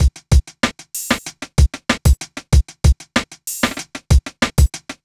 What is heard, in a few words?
kit; Sound Effect Library